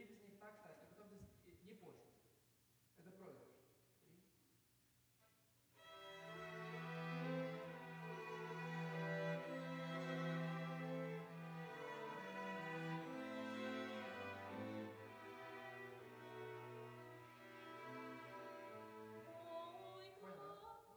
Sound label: Piano